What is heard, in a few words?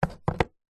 foley card box product pack set down